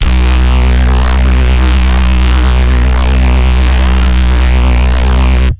C64 Bass; AMG - Prototype Drum & Bass

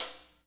HH, KIT Real-Kit; 90 s Sample CDs; Sound & Vision - Gigapack I CD 1 (Roland); Real-Kit s11

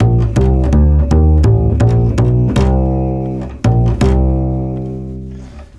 Double bass A# 165bpm, Bassloops; Zero-G; Total Drum Bass

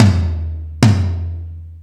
Ambient Rock, drum machine

